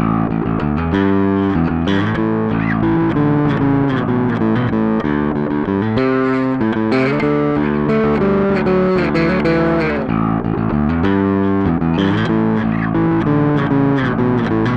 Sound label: Finger chords